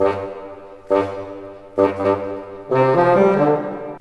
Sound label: BOLE, ORC Bolero; East West - Scoring Tools